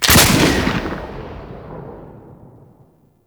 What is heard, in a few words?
Cannon Secondary Shot; Grineer